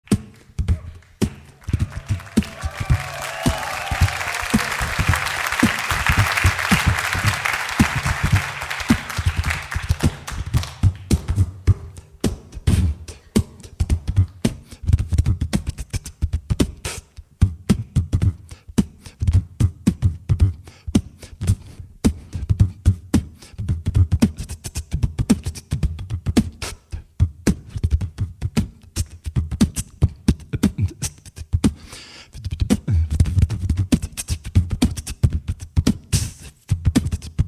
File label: mouthdrums